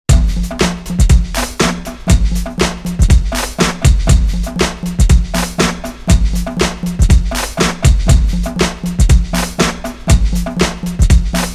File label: Drum Trans; Soundzabound